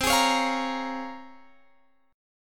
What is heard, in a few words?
Bb Minor 7th C; Bbm7/C strummed